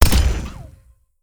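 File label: mech crossbow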